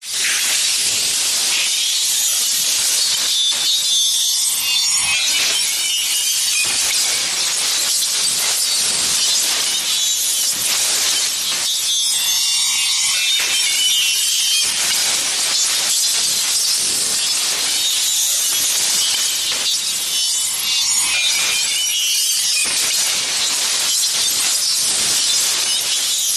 futuristic radio interference chatter magic spell cast conjure craft mobile app